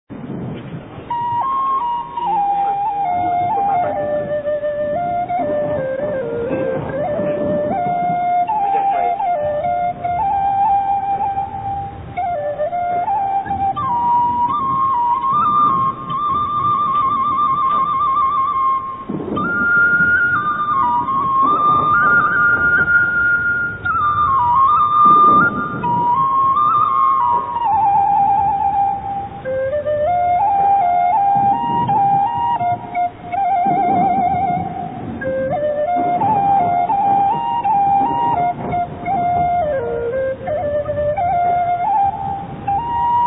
Instrumental Music, Lao Dam Nuen Xay Khui (Bamboo Flute) Vientiane; Vientiane/1996